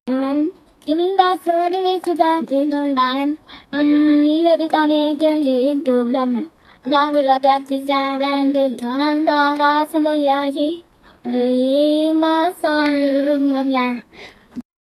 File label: indian girls walking with